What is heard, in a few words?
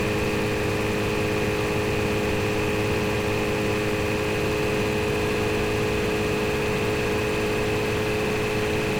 Porsche Macan S - Designed - 2k RPM loop, 3.0 V6; mono; Soundholder